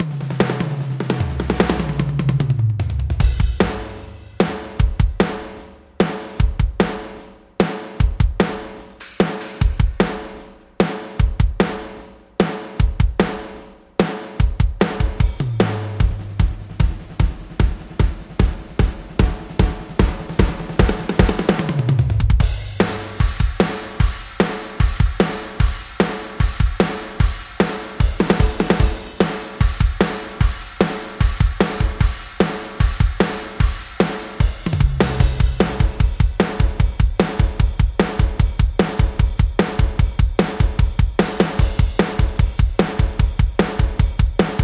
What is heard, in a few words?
pop pank drum kit drum only dry; polarity audio